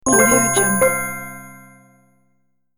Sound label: multimedia magic application notifier